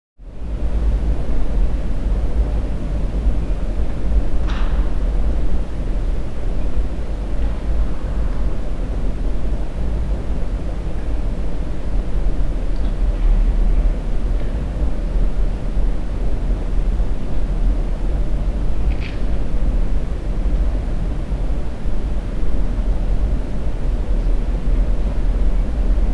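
Basic Large Stone Room Tone With Occasional Stoney Drips; Sound Ideas; Series 2000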